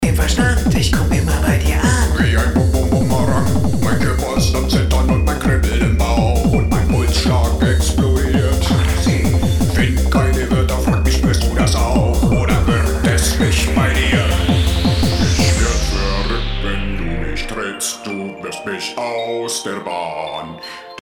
alarm mit bd909 combo; groove agent; retrologue 2

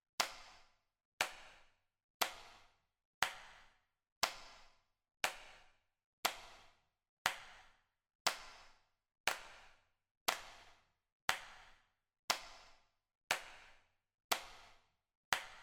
clap gruppe

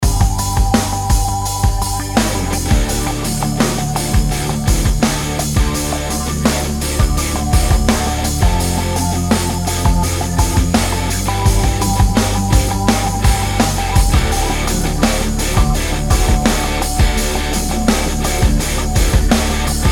test gitall, gitarrensound

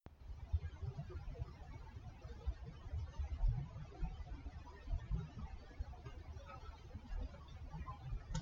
komische geraeusche; Mikrofon

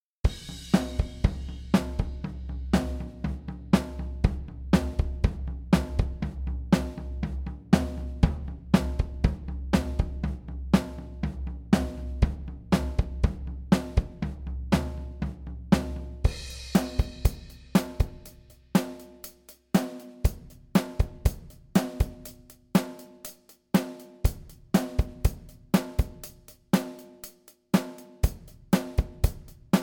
gretsch roh

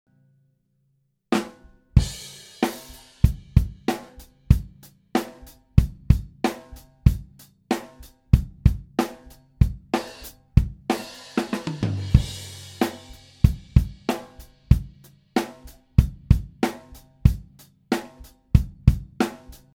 drums ohne fx

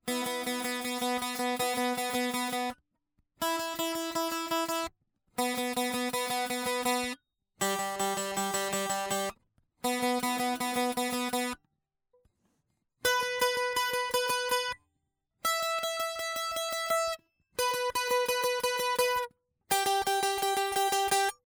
test, westerngitarre klirrt bei h-saite

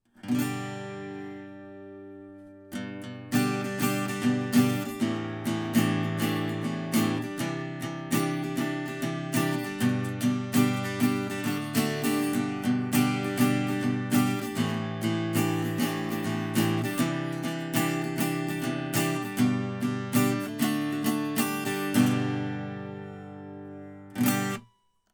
a guit m5p 3te bund

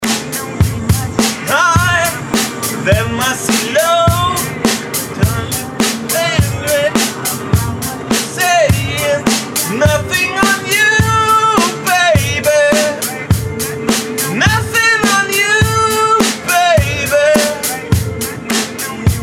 gesangskabine test; smart fortwo

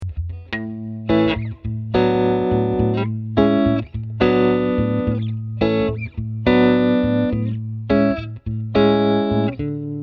Ampeg Jet-12 Gitarrenverstärker; Mikrofontest; A/B Vergleich

